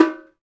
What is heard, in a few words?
Bongos; Spectrasonics; Supreme Beats; World Dance